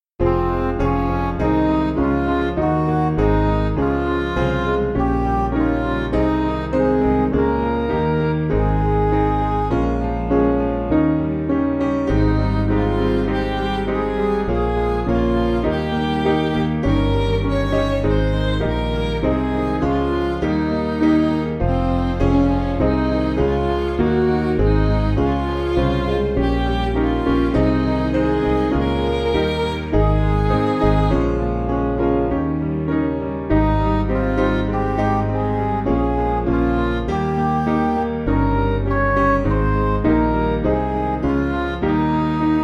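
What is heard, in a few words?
Music Backing Files for Churches; Jesus With Thy Church Abide; Hervey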